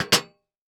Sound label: Foley Footstep Cowboy Boots Jump On Metal Platform; Foley Footsteps